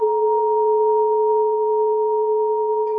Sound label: singing bowl sound